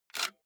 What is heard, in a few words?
cassette out of walkman